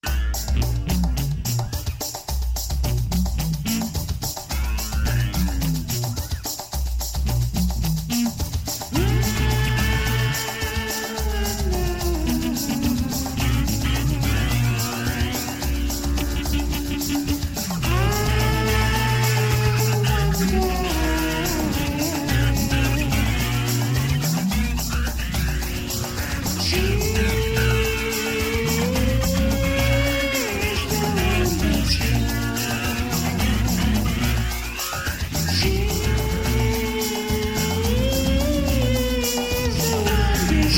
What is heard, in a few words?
Pentatonic Noise